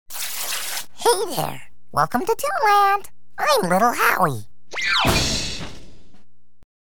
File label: chip